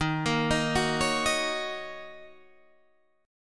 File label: Eb Minor 7th Eb Guitar Half Step Down